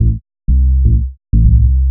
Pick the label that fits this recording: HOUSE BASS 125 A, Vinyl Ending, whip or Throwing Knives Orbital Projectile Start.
HOUSE BASS 125 A